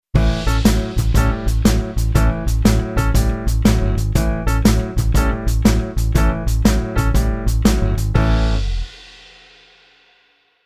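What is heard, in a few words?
keyboard piano blues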